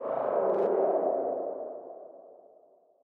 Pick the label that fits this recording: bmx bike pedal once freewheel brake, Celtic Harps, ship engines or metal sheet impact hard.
ship engines